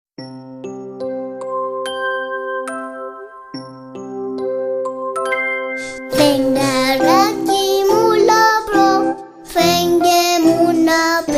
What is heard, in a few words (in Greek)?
Φεγγαράκι Μου Λαμπρό Ελληνικά Παιδικά Τραγούδια